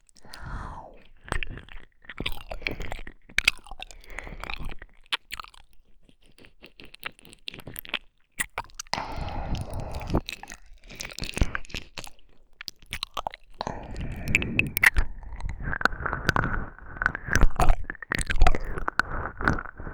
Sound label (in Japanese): 耳舐めのみ（右耳）, ASMR